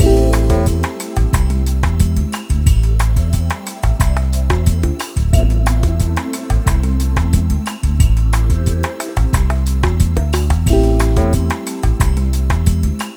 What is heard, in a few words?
Brasil Loop D